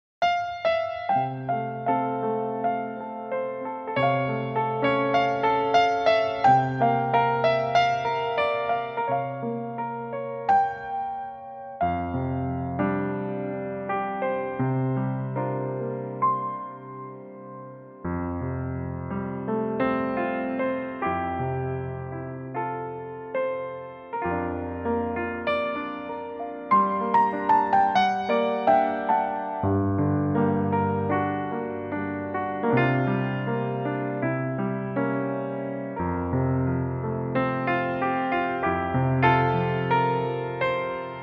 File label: piano play